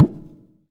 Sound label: TABLA GAMK; AKAI S-Series CD-ROM Sound Library VOL-5